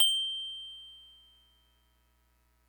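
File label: glocken G5, orchestral percussion; Greytsounds VOL-1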